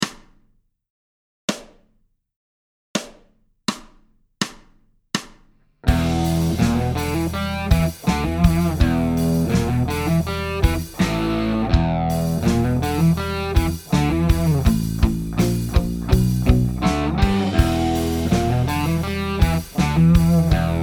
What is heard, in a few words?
Riff, guitar